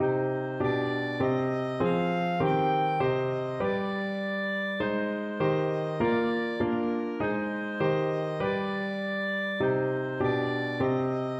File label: ending year, clarinet